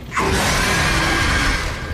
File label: jumpscare; catnap